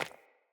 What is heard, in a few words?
Sandals Run Default, Footsteps